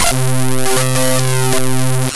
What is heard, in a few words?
laser